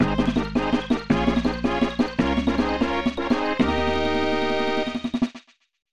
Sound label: battle start; Pokemon Stadium bootleg; SNES